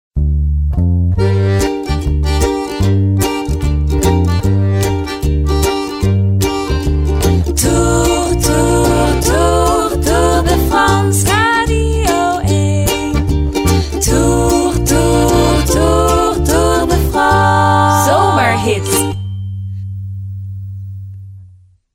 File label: jingle; Zazi